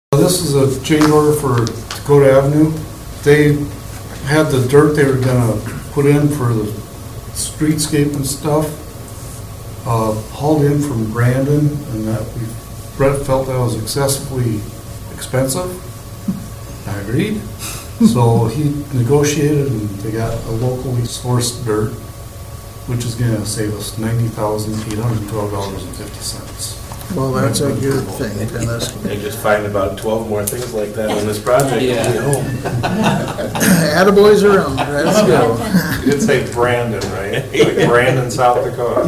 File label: water